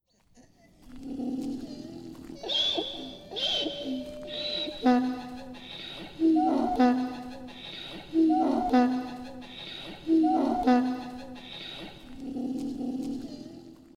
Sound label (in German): Eselstimmen